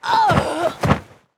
Metal elf Archer die

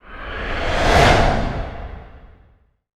Cleancing

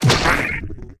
WAR DAMAGE, Mob

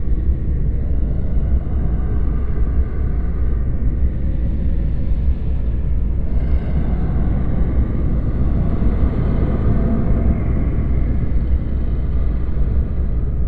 volcano, ambience

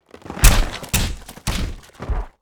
TWEEZER WAR ATTACK

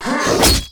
attack; MetalNight; Mob

